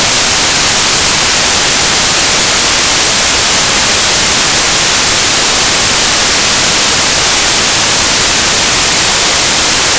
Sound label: noise